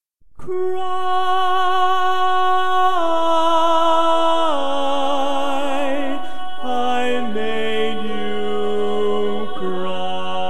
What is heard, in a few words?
Cry, Bass